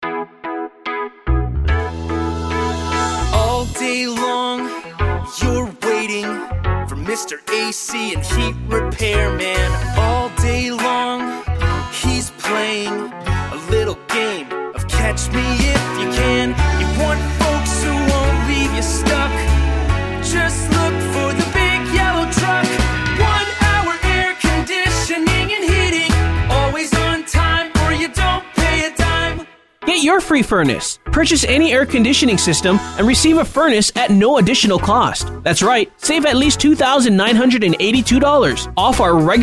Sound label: one hour jingle